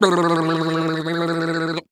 Voice Mouth Noise; Sound Effects Bible

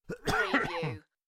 Male Cough; beanstalk audio

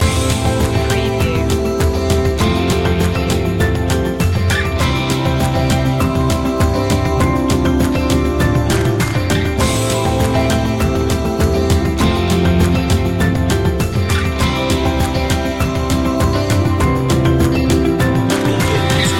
walk together loop

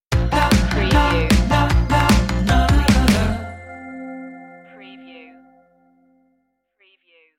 snap out stinger

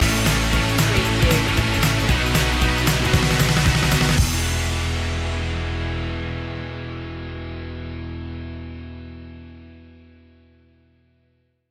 rock me indie stinger

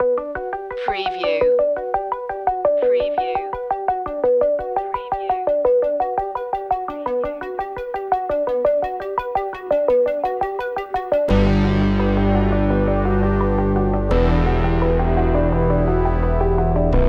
electronic thriller

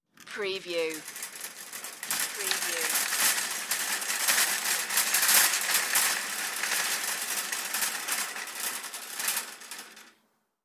shopping cart

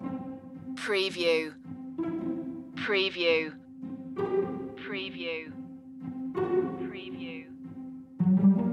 quirky creatures loop

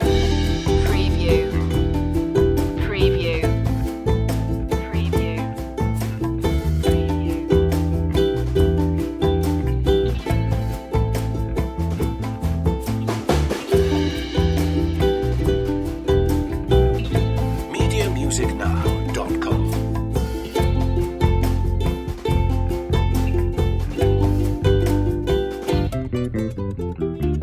confident and happy loop

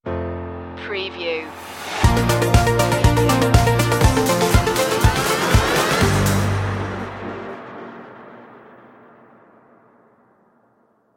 happy party stinger; media music now

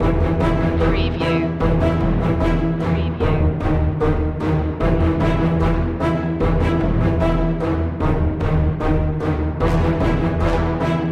neon nightscape impact

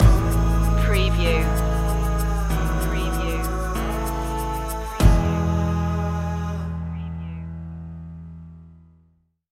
midnight shuffle stinger

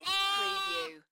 Lamb bleating; beanstalk audio

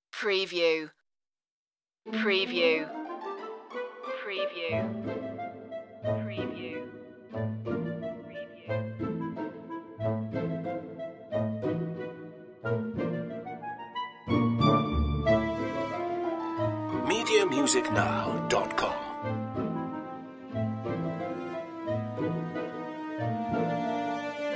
orchestral circus

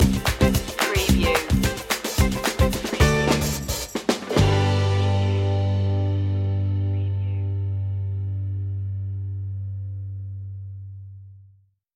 color fun stinger

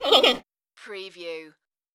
creature talk

